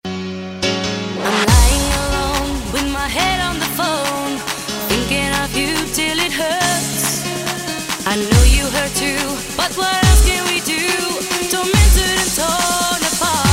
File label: sms ringtone